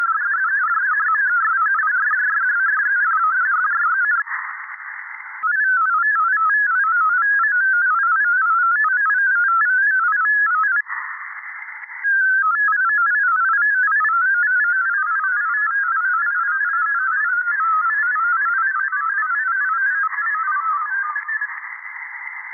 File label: VARA-HF, digital modes; Digimodes